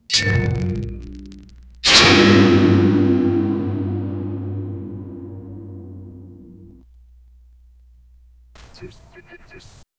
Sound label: full bodied fluid metal w